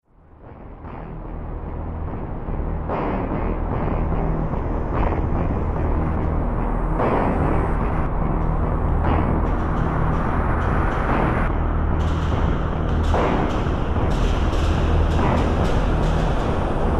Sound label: Drone Rebel Storm; Drone Sound Effects